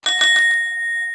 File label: collide; trippytable